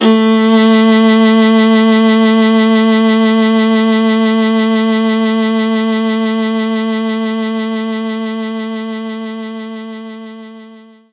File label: Viola A3